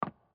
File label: Run; GlobalGameJam-2021